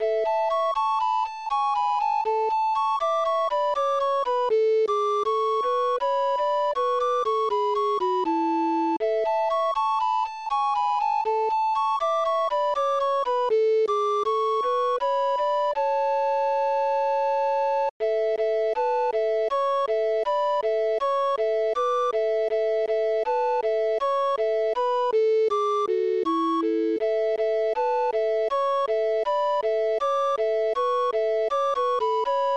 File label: minuet; Crawshaw ms